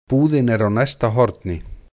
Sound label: horn